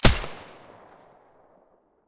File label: mdr silencer stereo, weapons